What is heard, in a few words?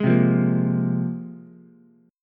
Bb 7th Suspended 2nd Bb Ukulele, chord; 1,0,1,1; Bb7sus2 strummed; JGuitar